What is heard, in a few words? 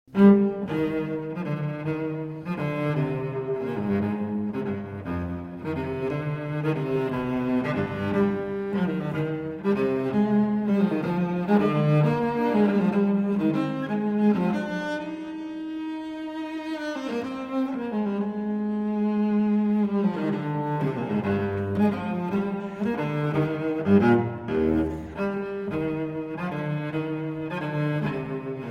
Unaccompanied Cello Suite No. 5 in C Minor Gigue; BWV 1011